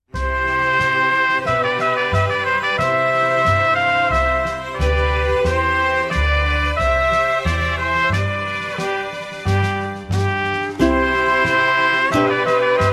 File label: Ukulele And Trumpet Voluntary; Classical Ukuleles A Collection of Favorites for Young Players